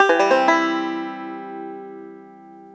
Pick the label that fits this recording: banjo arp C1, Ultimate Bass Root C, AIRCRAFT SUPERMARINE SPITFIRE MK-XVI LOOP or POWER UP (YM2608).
banjo arp C1